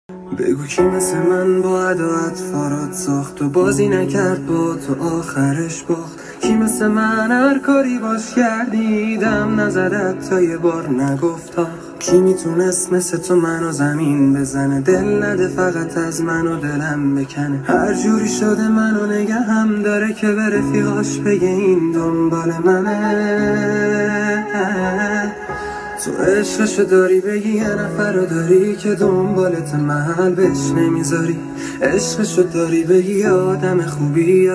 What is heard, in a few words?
music sad; آهنگ غمگین گریه آور مخصوص اینستا